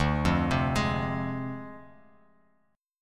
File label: D Minor 7th Flat 5th, Dm7b5 chord, Bass Standard; arpeggiated